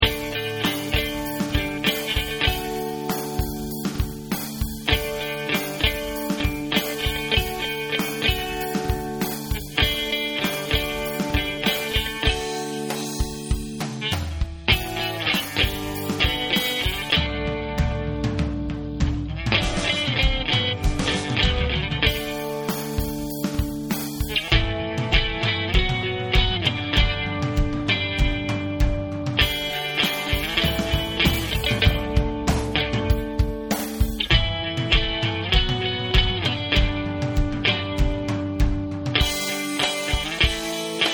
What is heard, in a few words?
rock swing